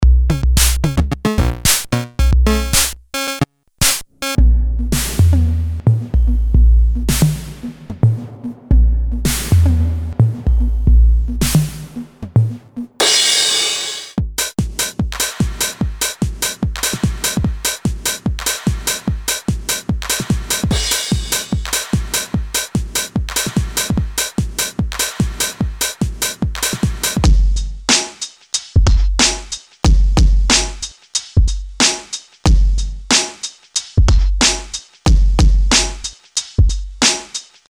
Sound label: Drums